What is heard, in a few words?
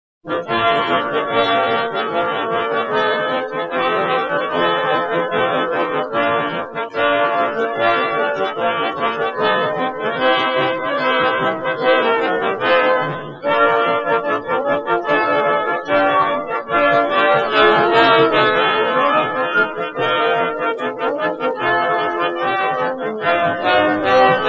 Filtered Excerpt March Song; RCA Victor; Miami